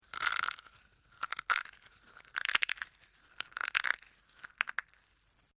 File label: DRY BRITTLE SNAPPING SEQUENCE; Various Organic Foley sounds; Human